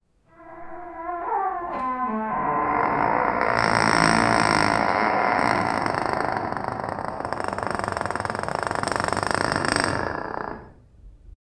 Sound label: ancient tombs stone door grinding open